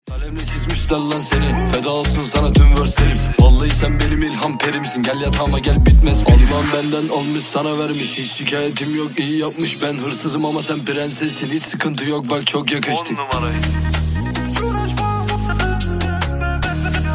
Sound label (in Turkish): Rap HipHop Zil Sesleri